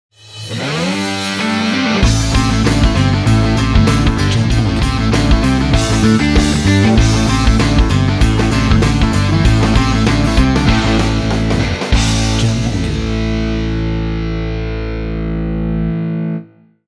Powerful Logo Ident

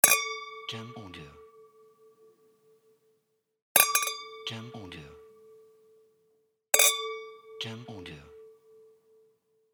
Wine Glass Clinking

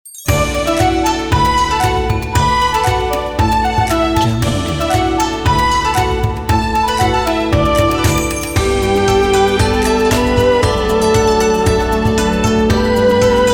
Chinese Asian Background

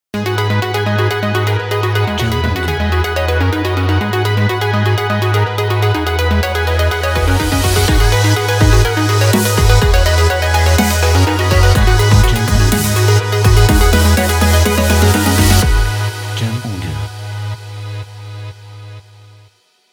Fashion EDM Electronica Intro

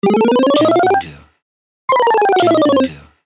Game Progress

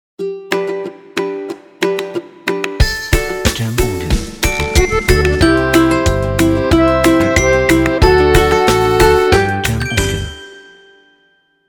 Funny Kids Logo

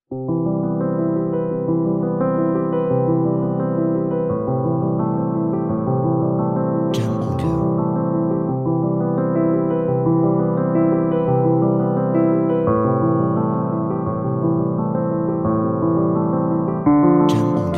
Light Piano Romantic

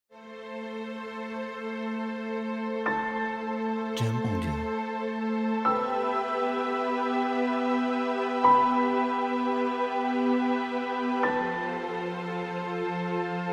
Ambience Background

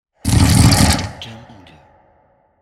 Horrible Monster

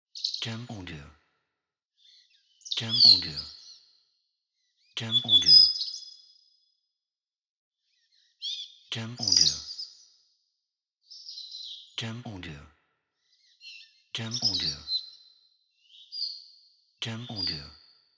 Birds Singing

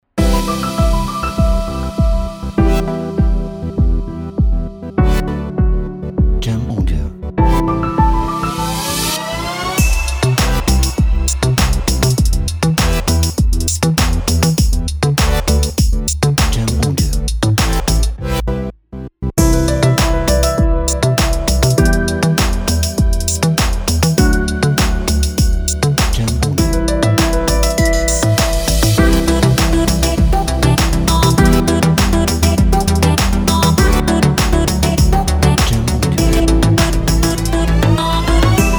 EDM Corporate

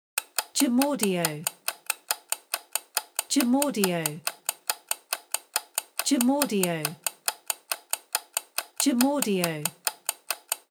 Timer Clock Ticking

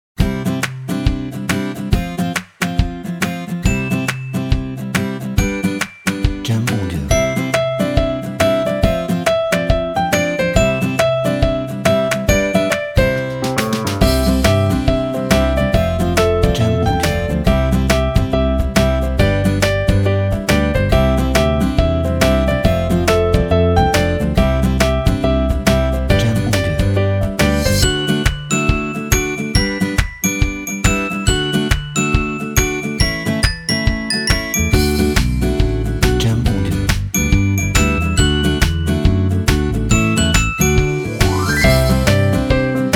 Summer Music Background

